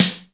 MIX SD, DRUM RYTHM; AKAI S6000 CD-ROM - Volume 1

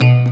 PLUCK, Synth FX Misc

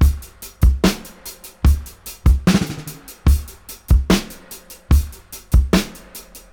73, Dub, DRUM LOOPS 073 BPM

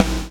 BLOW; Spectrasonics - Backbeat

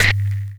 Trap Hit; Sonic Foundry (Sony Creative Software); Esoterik Beatz

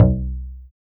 XTRA PERC

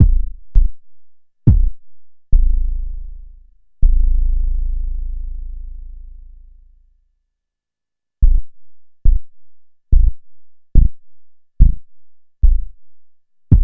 Kick High Quantity

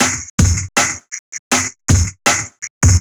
Classic Beat, Drum N Bass

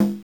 Bongo; Genesis

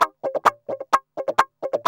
GUI, LICKS; 130 LICKS

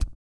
Vocal, Blues & Soul; ILIO; Vocal Planet VOL-2

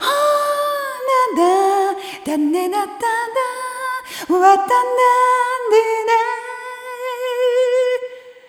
Arrhythmic G; Sonic Foundry (Sony Creative Software); ILONA

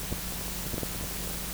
Misc Noise

